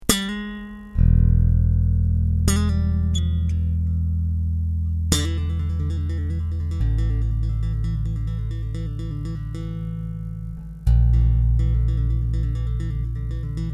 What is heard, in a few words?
slap, bass